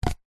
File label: cardboard tube catch hand; zapsplat